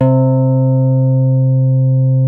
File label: SIDE MID C3, SYNTH PLUCK